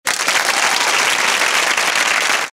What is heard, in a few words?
applause